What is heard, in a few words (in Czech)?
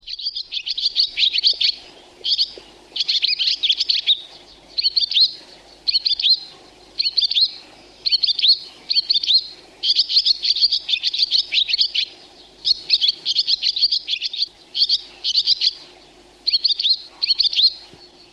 zvuk, ptaci